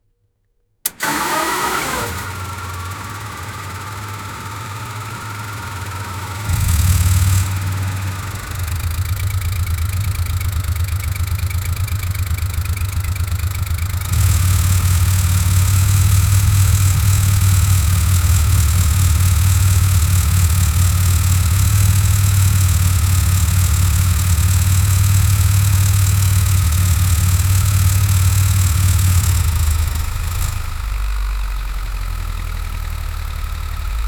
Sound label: a 1967 shelby gt500 with, cold start